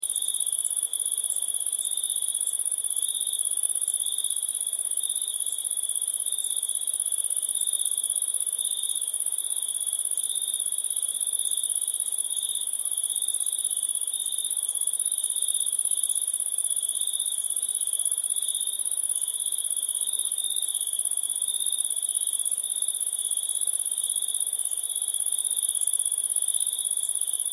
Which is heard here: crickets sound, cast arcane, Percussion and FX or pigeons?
crickets sound